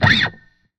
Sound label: Guitar String Slide Noise